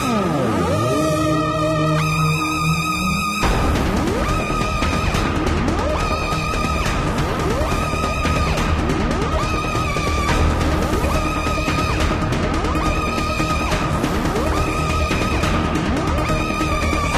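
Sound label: Battle Music; Android; mobile; Windows; Xbox One; Ailment Batte Music 3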